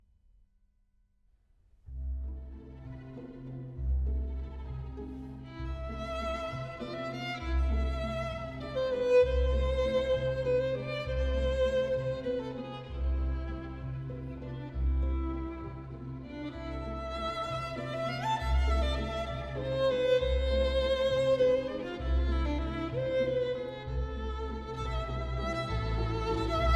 Suite for Violin Viola and String Orchestra, Chamber Orchestra; Suite No. 3; Op. 19: III Vision